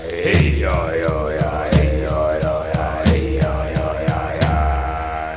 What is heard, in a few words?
drum army tribal stomp